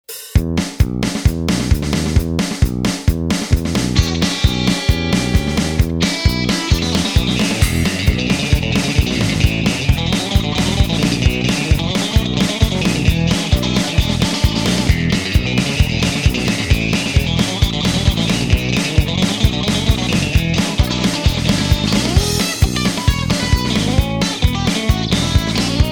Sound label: Country